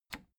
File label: plastic button latch loose push disable